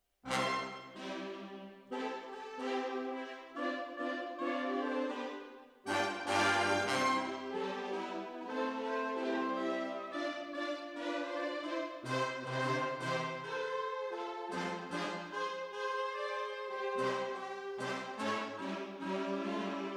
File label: Hall, Jazz for piano